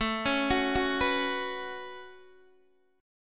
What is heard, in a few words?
A 6th Add 9th, Guitar, Open D; x,12,11,12,9,9